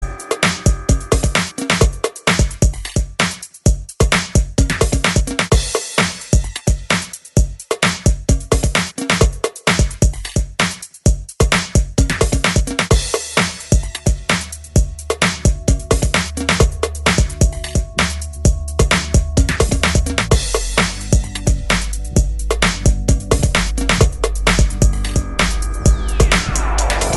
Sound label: electric soul